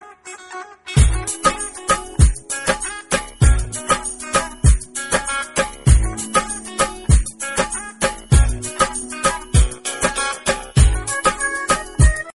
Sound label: rocking beat